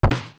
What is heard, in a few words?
Arrow Hit; Zelda; LTTP